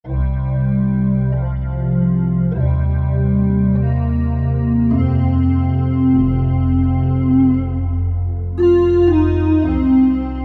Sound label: Dumbek; Korg MSC-10S Ethnic